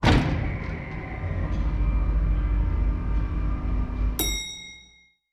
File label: elevator